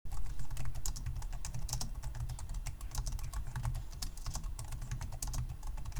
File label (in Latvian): Logitech K470 tastatūra